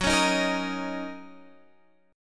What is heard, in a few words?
Fsharp 6th down Guitar Standard; strummed; JGuitar